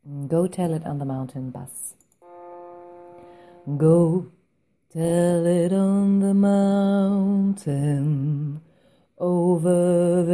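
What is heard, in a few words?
Go tell it on the mountain bass, gospel choir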